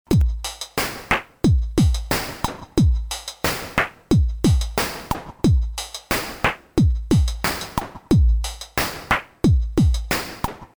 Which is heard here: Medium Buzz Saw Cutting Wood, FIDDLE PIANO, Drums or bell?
Drums